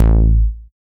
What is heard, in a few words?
TITE BASS; Masterbits; Dance Trax